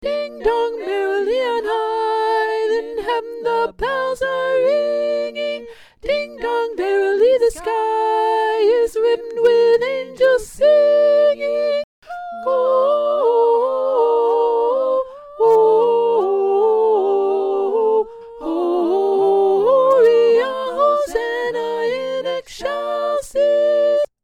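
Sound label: Soprano; Ding Dong Merrily On High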